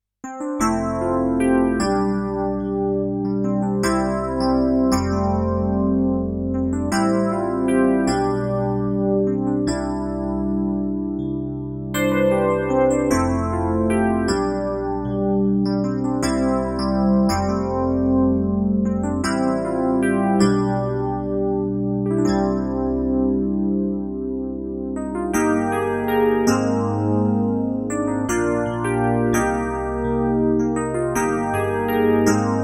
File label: Vivo SX-7 FM Piano; Dexibell